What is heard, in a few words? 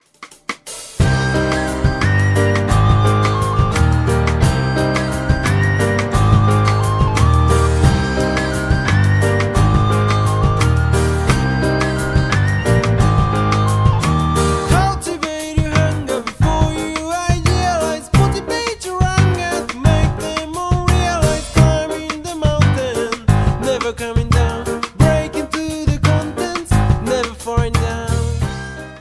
wind ringtone; naruto